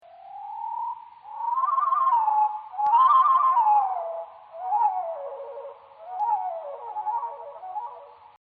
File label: Veery quarter speed; Macaulay Library